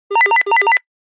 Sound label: technology CB radio call ring tone